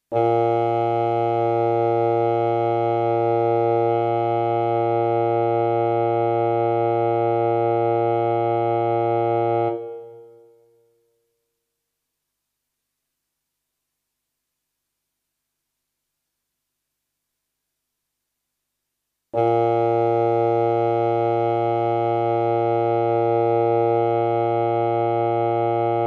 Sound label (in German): Stimmton B; Blaeser-Team